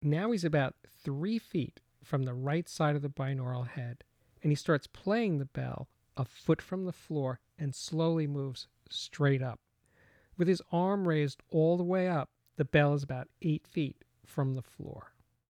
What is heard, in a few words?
Right Channel Bell Announcement; The Ultimate Headphone Demonstration Disc ( 24Bit192kHz ); Chesky Record